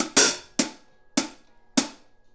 Hi Hat Pattern

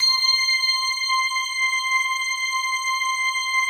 PAD, DIGI-PADS

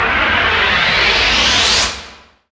FX SPACE, SPACEFUNK; Sound & Vision; Gigapack II CD 2 (Roland)

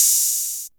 808 HH Op; Roland MV8800; DR-55-S

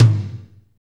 tom, fast shuffle, Roland; Northstar; Drumscapes Roland; Toms

